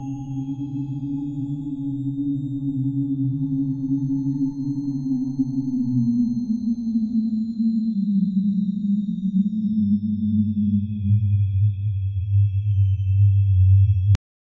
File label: a space worm growl; alien